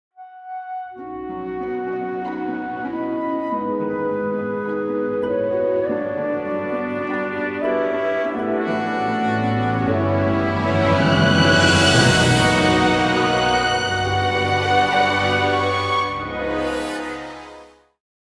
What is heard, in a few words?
Romantic Movie Music